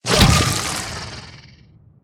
spitter death big, creatures

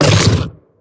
biter roar big, creatures

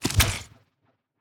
Spiters

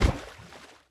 landfill large; Factorio

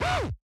spidertron leg

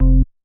bass, programmable speaker

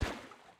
landfill small; Factorio

